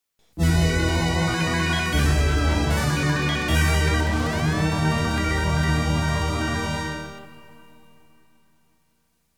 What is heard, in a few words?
Game Over!!